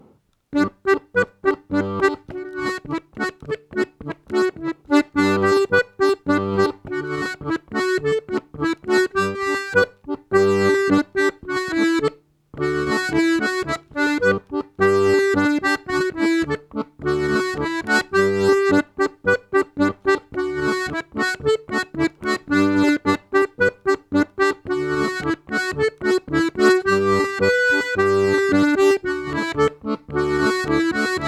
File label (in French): polka piquee